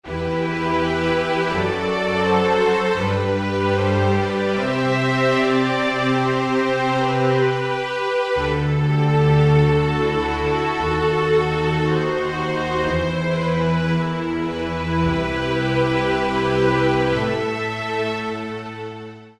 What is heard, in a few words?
Strings Arco, Roland SR-JV80-02 Orchestral